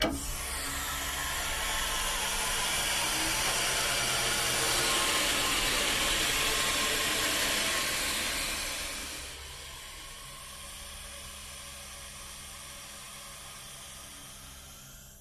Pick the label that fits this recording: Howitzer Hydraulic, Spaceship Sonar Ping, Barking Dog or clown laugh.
Howitzer Hydraulic